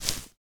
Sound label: Footstep Grass Heavy; Footsteps